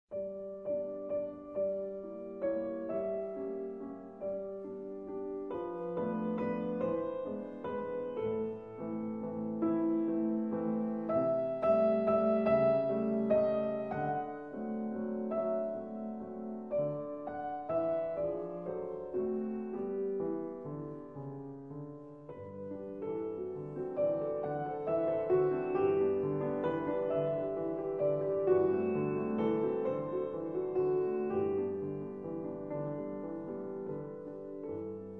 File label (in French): orgue de barbarie